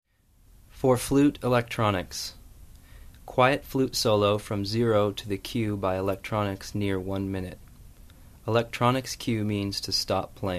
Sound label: Score Flute; EricRoth